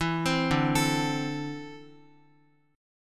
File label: D 7th Suspended 2nd, D, down, Guitar; 4F,4A,5C,5G,5C,6E; arpeggiated; JGuitar